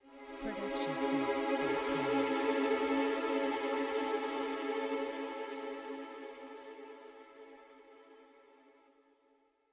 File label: somber strings transition; soundscrate